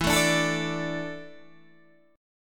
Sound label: E 7th Suspended 4th, Guitar, DADGAD